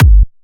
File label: Trance Kicks, Bassdrums